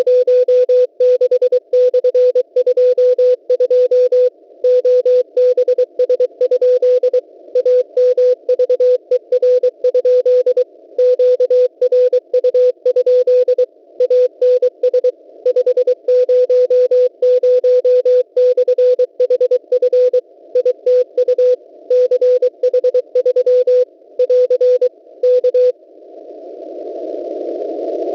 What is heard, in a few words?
6477.50 cw; WebSDR records